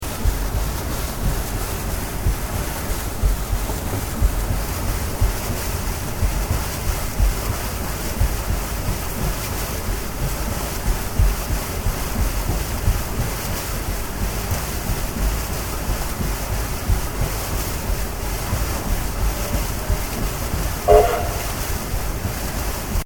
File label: Ambience Paddle Steamer Stereo, Paddle Steamer operating on the Murray river; Paddle Steamer operating on the Murray river near Echuka Victoria